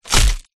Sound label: impact wood hit thud crunchy splintering